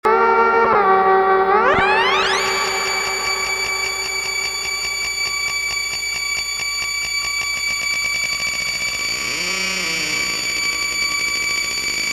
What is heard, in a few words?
glass alien